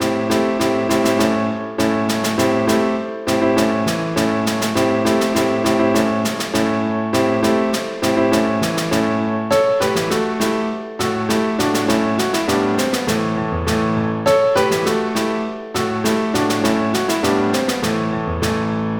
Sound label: snare dance